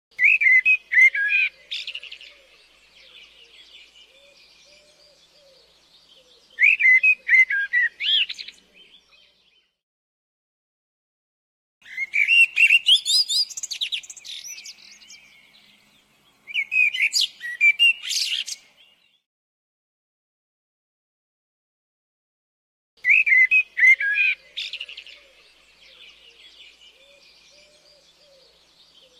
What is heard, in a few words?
nature blackbird